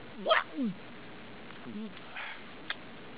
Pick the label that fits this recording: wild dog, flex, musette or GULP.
GULP